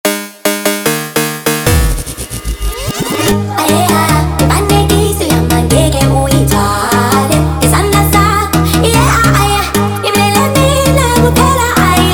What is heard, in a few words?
sped Up, funk